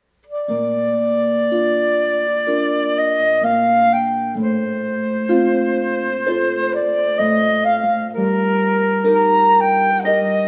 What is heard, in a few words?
Bach Arioso with flutist, harp